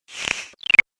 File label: Fantasy Mage Spell, magic; Magic Sound Effects